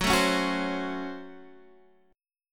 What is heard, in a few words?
C sharp Major 7th Suspended 4th Sharp 5th F sharp